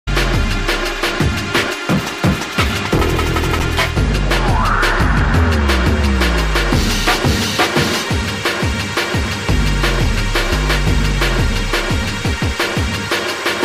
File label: Drum and Bass Jungle and Old Skool, UK Bass Radio